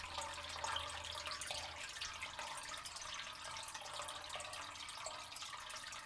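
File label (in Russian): вода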